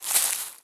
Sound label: Grass footstep; Starbites